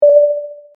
button

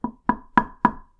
knock on thick glass; knocking

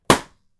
balloon bursting

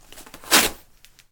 tearing cardboard

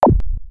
eating; packun; anime

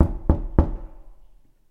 knock on door; knocking

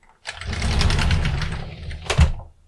dish drawer

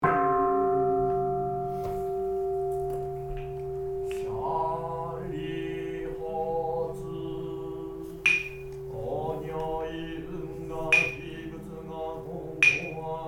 Buddhism sutra; jidaigeki

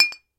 empty wn bottles; 空の瓶同士ぶつかる２

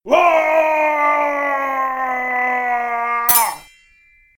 katana; jidaigeki

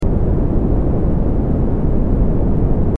atmosphere

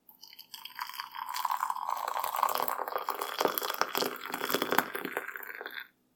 pouring hot tea; eating

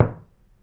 knock on floor; knocking

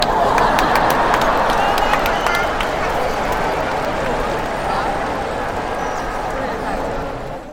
baseball cheer

